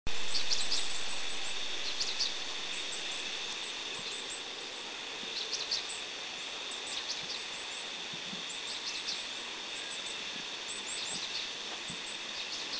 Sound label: Varied Tit; Sittiparus varius castaneoventris; Huisun; Taiwan; 10+ at Huisun